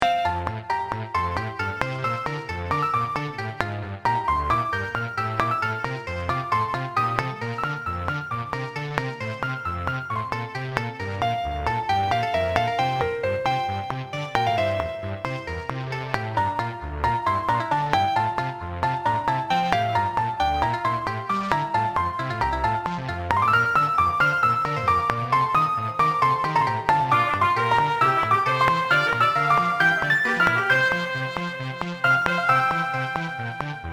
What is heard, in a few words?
bicycle; BGM; infinitefusion